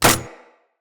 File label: Rolling Drone Landing; Characters; Grineer